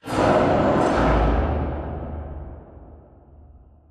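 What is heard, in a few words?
Assembly Wall Rail Distant; Titanfall 2; Boomtown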